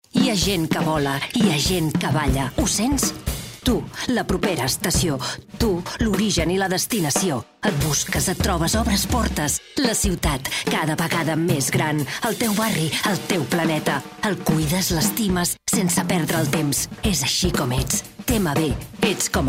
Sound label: female catalan sing public transport comercial; ideasonora